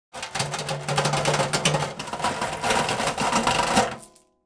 Steel chains scraping metal

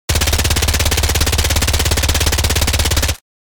MAC-10 submachine gun full auto fire; war

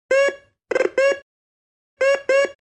Metal detector searching beeps; foley

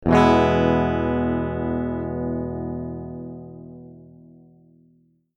Electric guitar E major single hard strum

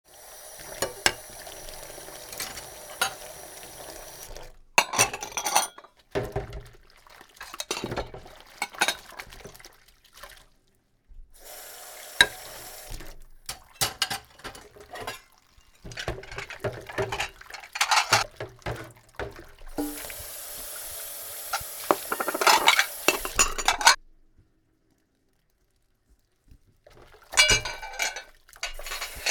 Dish washing glasses glass clinks and dings; household

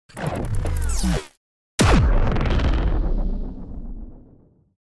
Sci-fi weapon proton bomb explosion

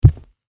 Footsteps on wooden floor barefoot single step

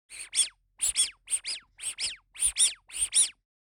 Rubber duckie toy squeeze and squeak

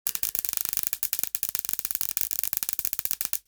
Electrical zaps electricity sparks; sound design effects

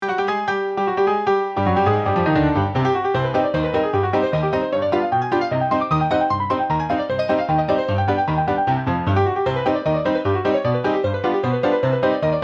Fast tempo meme; rushed decisions

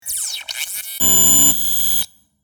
Medium glitching noise burst

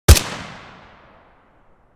Dragunov sniper rifle single shot; guns

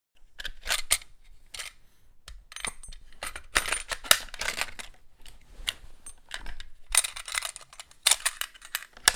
Sniper rifle handling foley movement

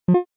Hi tech button click interface

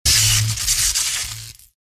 Electric shock

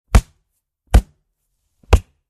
Solid martial arts or karate blow

